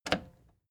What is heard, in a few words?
plastic button slack push